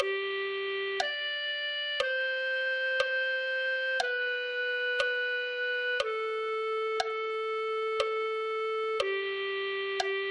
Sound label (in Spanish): clarinet1